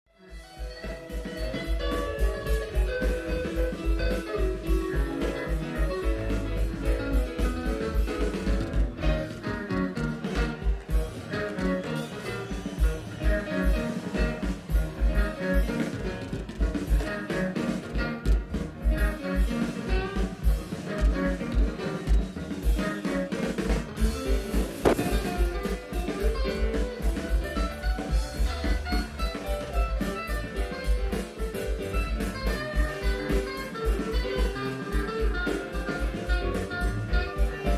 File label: Drums